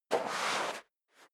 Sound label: step leather shoes sand scuff; schritte